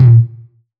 909 Tom amp; Goldbaby Tape808 & Tape909; The Tape 909

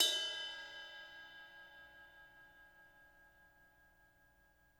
RIDE, RIDE CYMBALS; Spectrasonics - Backbeat

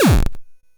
Drums, Hits; DCM8

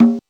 DP50 Conga, Technics PCM DP50

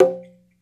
IYA CHA, World Percussion

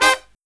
low chord, brass riffs

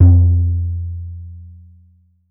small surdo

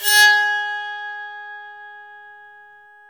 BOWED PSA, Bowed Psaltry

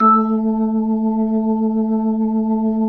B-3 A 4, Jazz; Keyboards of The 60's and 70's

